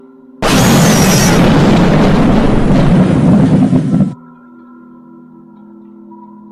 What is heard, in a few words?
t-rex jumpscare; tech demo